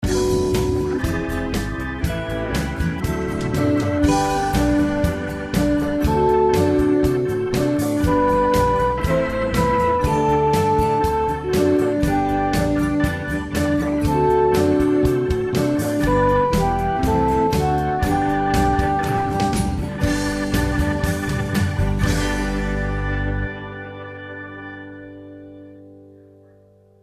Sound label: Gospel Acclamation